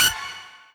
build up lead

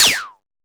normal hitwhistle

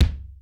A Very Drum Kick

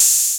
Ride

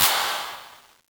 Clap

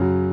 piano low

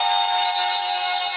synth main

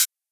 drum hit whistle

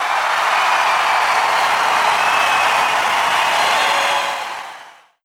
cheer

